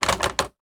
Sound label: Toilet Paper Dispenser, Bathroom; 1001 Sound Effects